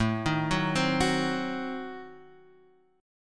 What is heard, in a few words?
A Diminished 7th A down Guitar Standard; Adim7 arpeggiated; JGuitar